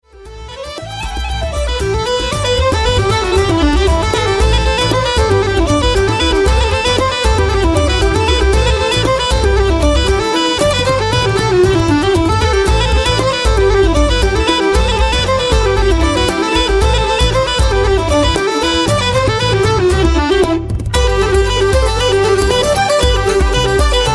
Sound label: Dub